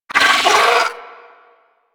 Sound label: Amalgam Moa Satyr Vocal Pain; Warframe